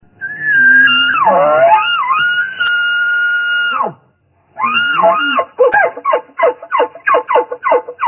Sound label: bugle, bowhunting